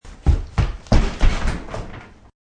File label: Qk Run Wood, Fast run on wooden floor.; Running (Sound Effects:Human)